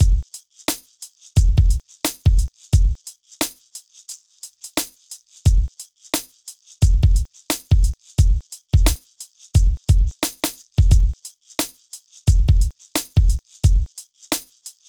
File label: Drums, Hip Hop